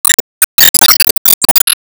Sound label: Enemy Teapot Teacup Approach; disney star smash